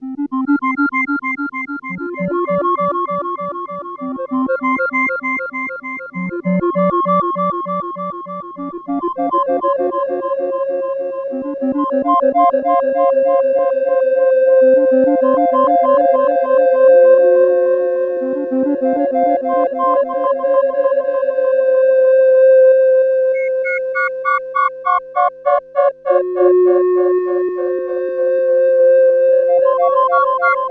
Tuning

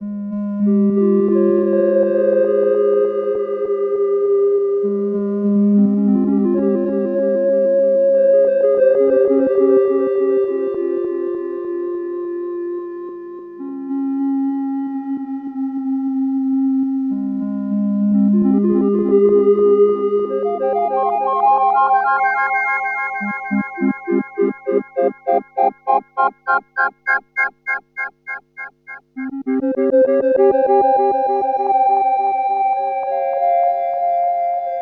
Tuning

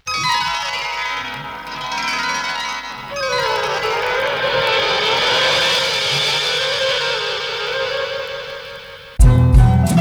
Piano Garden

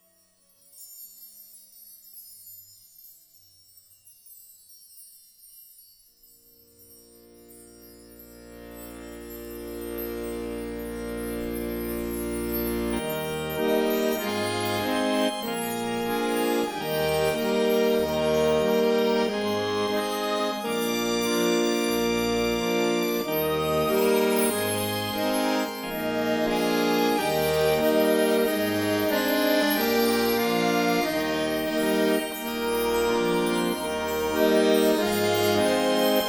Accordion; Harmony 5

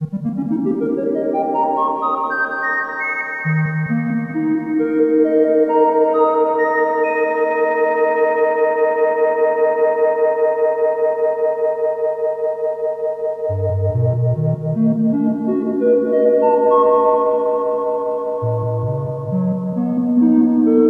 Tuning Seq1

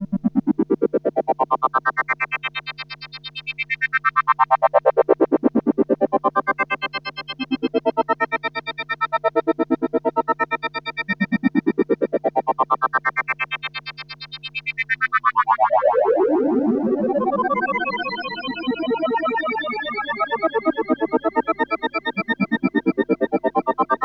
Tuning Seq1

